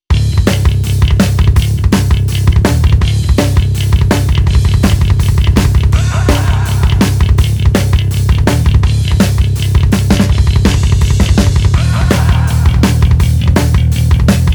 165 bpm, Rock

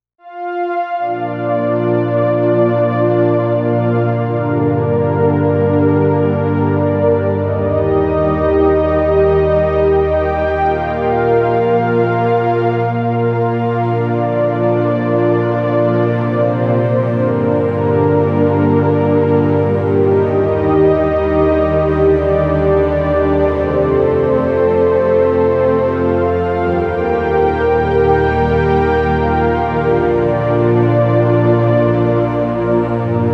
soundtracks, 75 bpm

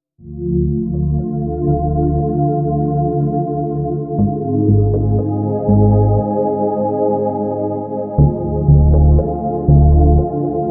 soundtracks

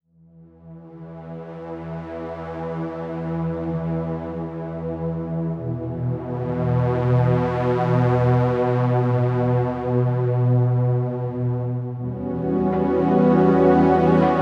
soundtracks

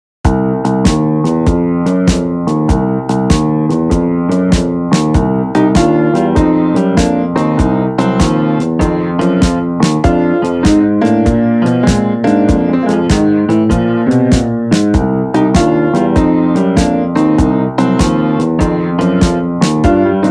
blues

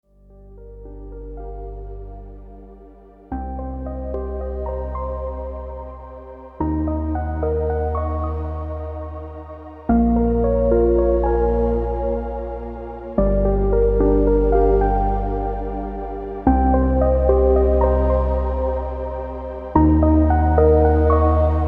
soundtracks

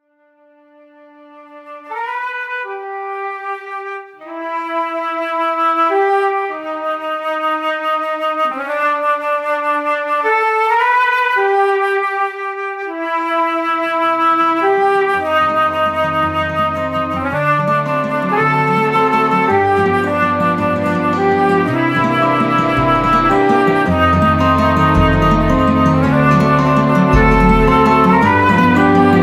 110 bpm, folk music

